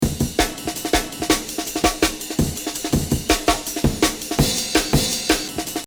Amen Chop

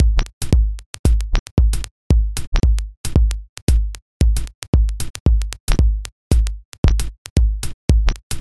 Minimal Tech

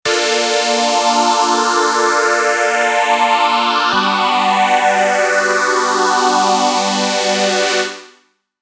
strings

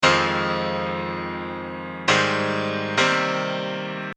middle8 chords

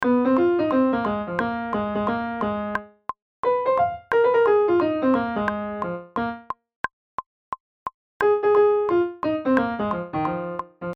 sax

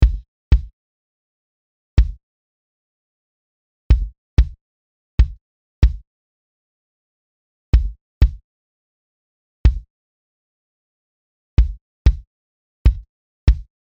kick original

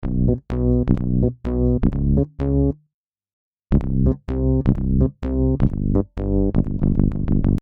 Bass

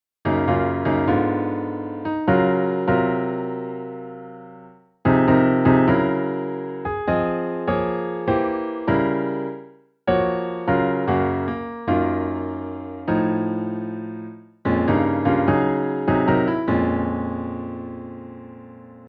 drop

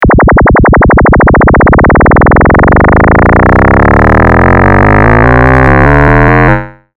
sine

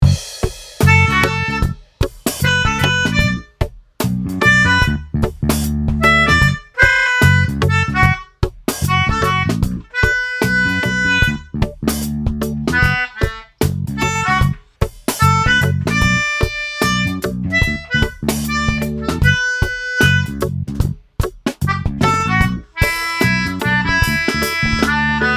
Logic Phasor

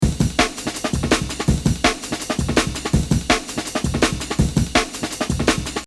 Funky

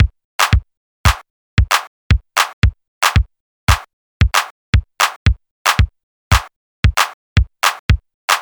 Kick Clap

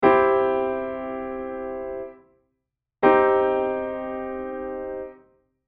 c maj c mi

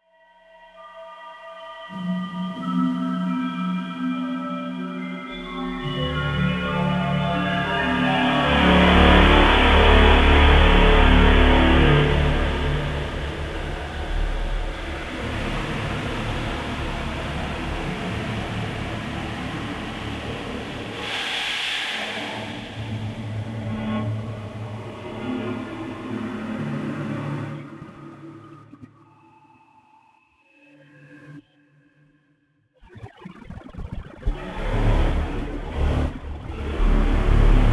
Space Drone

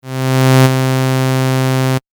adsr

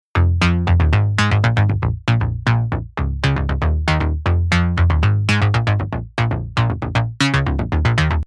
polysix

